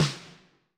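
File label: Lo Room Snare, Alesis SR16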